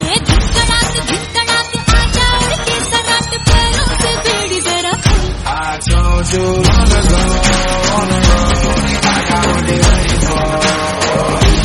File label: dhol